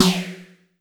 SNAPP; Masterbits - Total Zone; ADD.PERC.

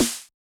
Yamaha DJX 2B, SD T9 M

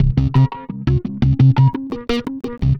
Funkamonica; Sonic Foundry (Sony Creative Software)

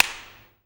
CLAPS, SINGLE CLAPS; Best Service; World Colours

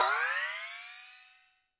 percussion, Roland TD-20

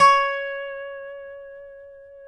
DOBSUSDB5 -L, dobro notes; Country

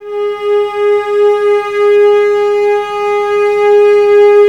Cellos G#4, String Ensembles, No Vib; Miroslav Vitous

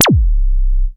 EFX, SFX KIT; Wizoo - Platinum 24 Electronic

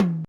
Tom, drumtraks

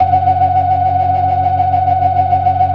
FAST VIB, ORGAN, TREMOLO VIB

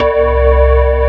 METAL BAZZ

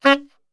C#2 X SAX SHOT, Brass Super Section; Best Service

